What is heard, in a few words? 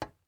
glass step